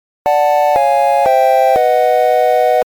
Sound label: lose; RS Games Sounds; Exploding Kittens